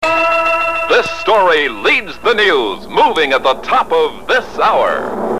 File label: news open; WWAB